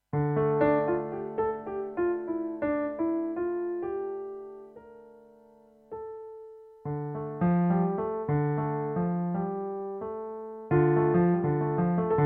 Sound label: chords, piano